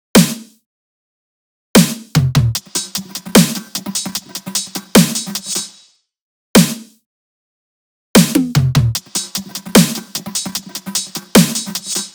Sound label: tops 150, Drum Sample Pack Loops Top Loops; SD SEISMIC; Slate